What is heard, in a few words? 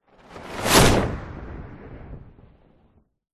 superhero fly by fast whoosh cape; fantasy; zapsplat